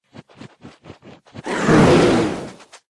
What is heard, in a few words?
Lava Hound Explosion